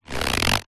handbag leather strap slide movement hanging chair; zapsplat; foley